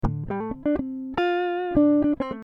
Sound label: Dm 100 GTR Riffs